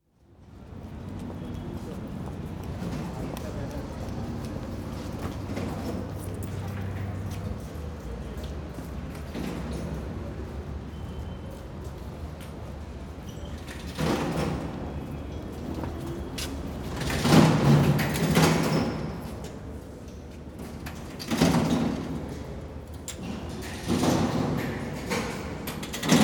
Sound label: Ferry Boarded, Istanbul; Gatala Bridge; The BBC Sound Effects Library